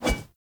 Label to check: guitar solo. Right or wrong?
Wrong